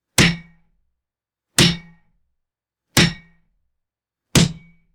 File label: sharp wooden stick hits with metallic ring